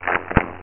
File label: CLIMB